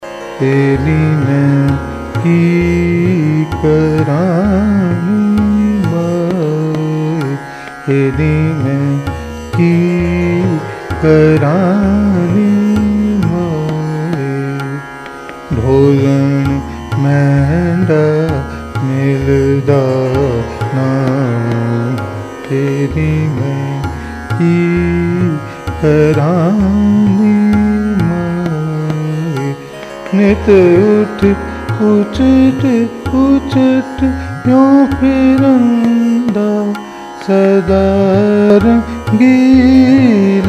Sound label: raag kalyan fast kheyaal